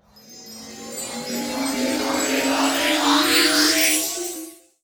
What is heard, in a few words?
fs butter Attention; netent